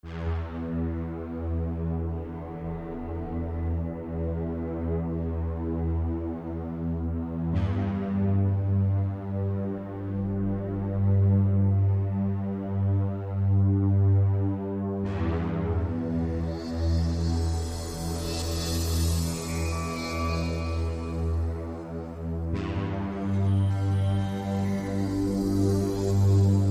Drone

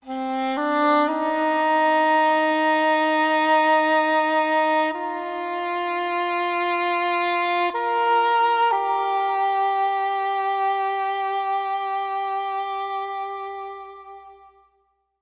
Woodwinds Only

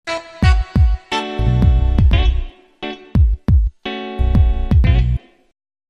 Jazzy

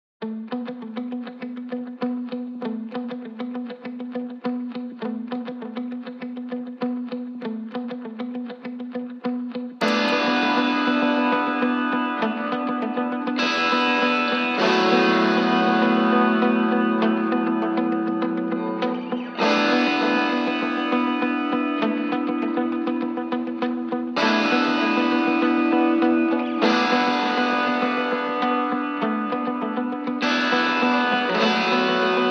Lead Guitars

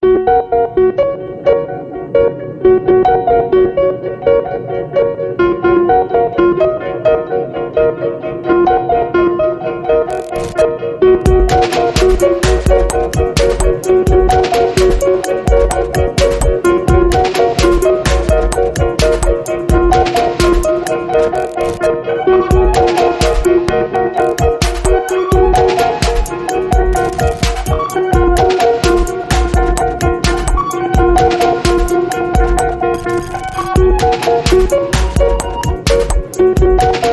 Harps

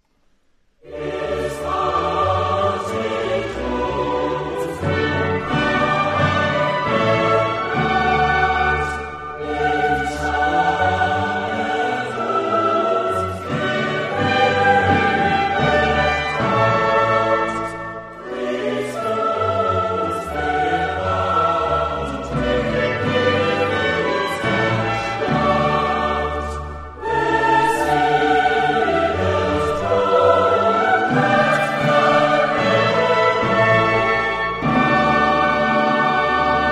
Easter Oratorio Chorale; Es hat mit uns nun keine Not BWV249; Johann Sebastian Bach

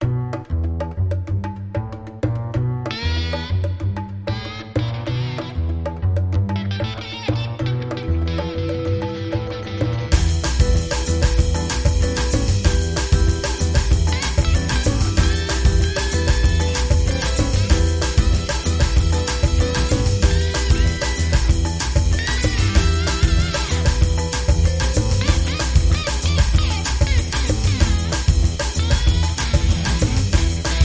Mad Guitar

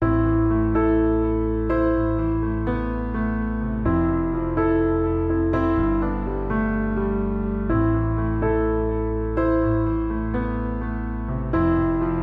Piano

